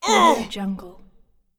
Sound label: male pain